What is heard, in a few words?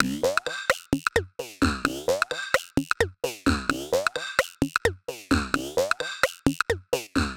Fx-Bl Loop 130, Perc Loops 130, Loungin House; Equipped Music